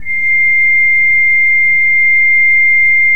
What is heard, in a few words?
KOPPELFLUTE C6, Cathedral Organ; Propeller Island